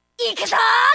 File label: Yell